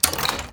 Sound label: skill mech close